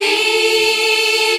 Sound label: "abba eee", Voice